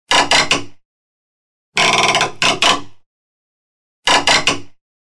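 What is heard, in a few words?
nail puller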